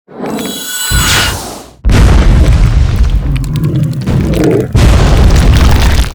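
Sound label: arcane golem attack, monster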